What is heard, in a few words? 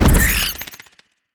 dusk beasts cast; heroes; anya